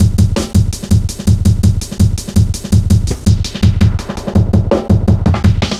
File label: Hard Edged, Drum Loops, 165.5